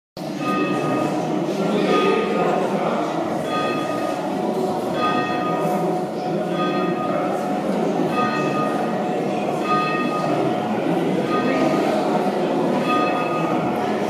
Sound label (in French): SONNERIE CLOCHE; ST ROMAN LES MELLE